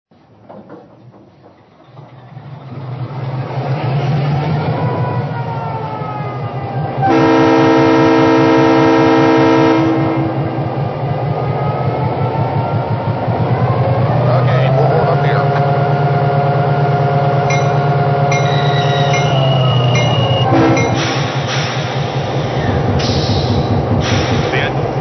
EMD F3, trains

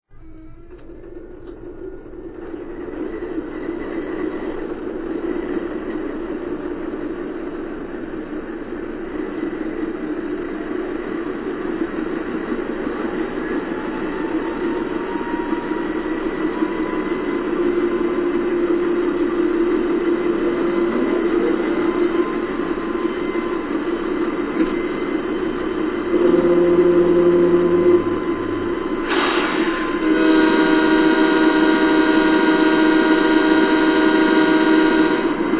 AC4400CW

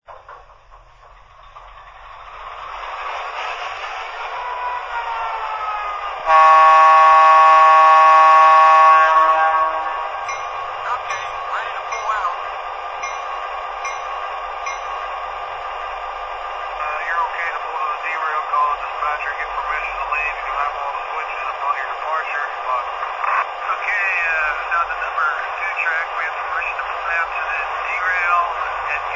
F3 A, trains

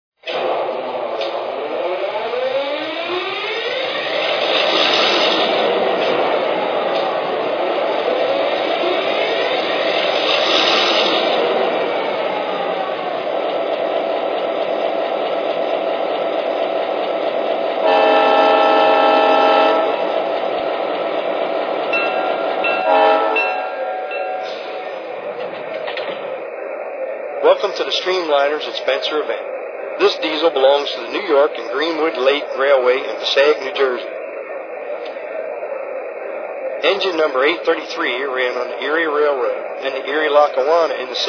e8, trains

